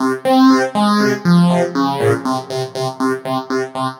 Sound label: Phased Synth